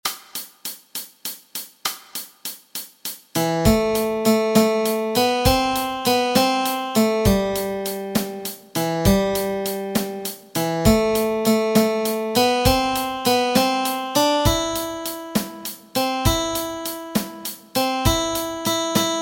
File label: Melody Track